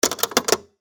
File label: tape insert